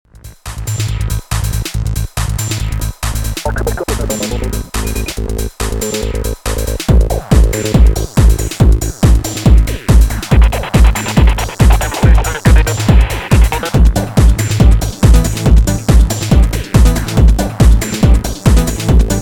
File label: techno